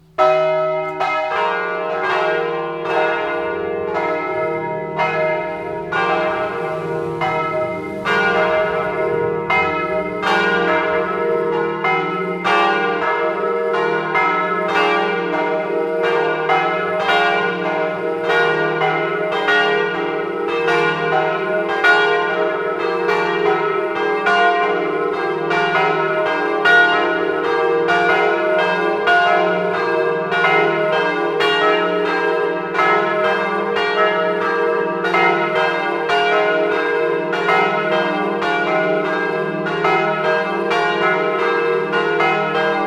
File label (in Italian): Chions, campane